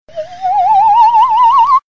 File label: Galactic Sound